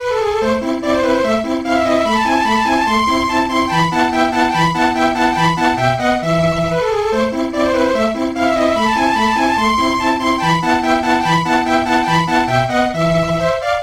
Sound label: warm pad